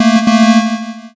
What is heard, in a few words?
wrong